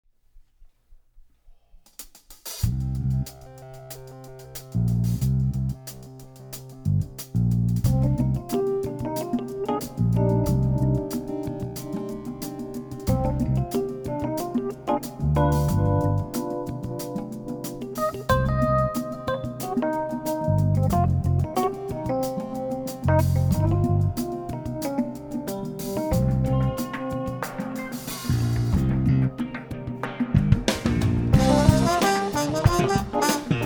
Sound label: Jazz Shadow Groove